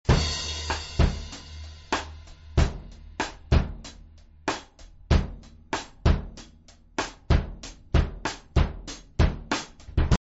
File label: drums